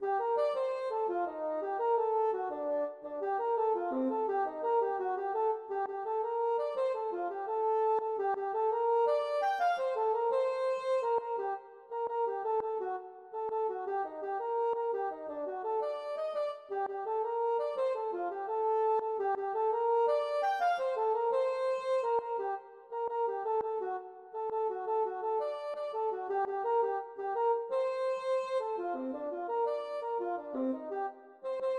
jazz Cha Cha

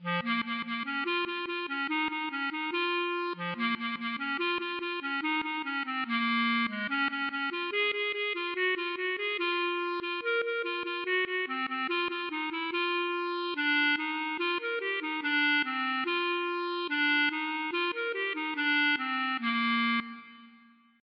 klezmer

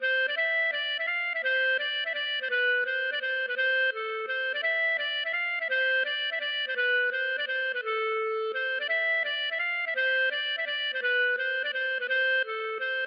mazurka

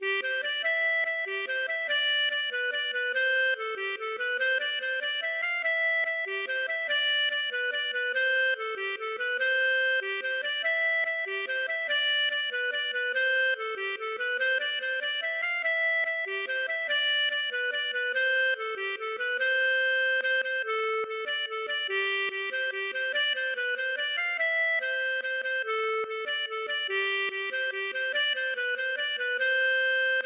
mazurka

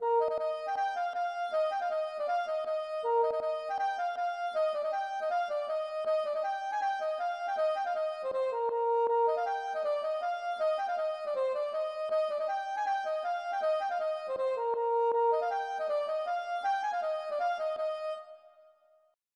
polka

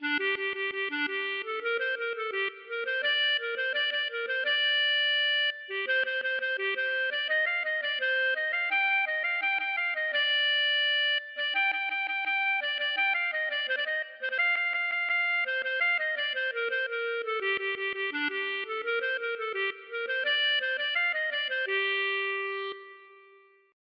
klezmer

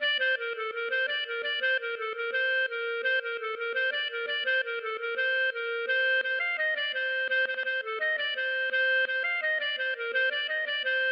gavotte; Honneur

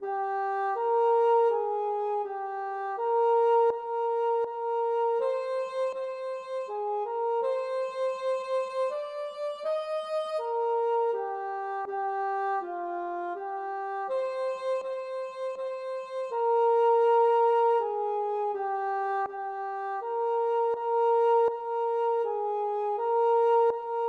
Tenor

